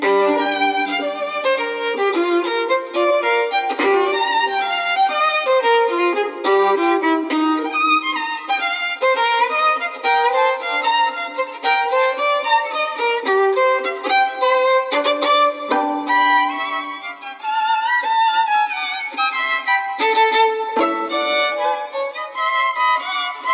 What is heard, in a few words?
Sonata for Solo Violin; Sz. 117; IV. Presto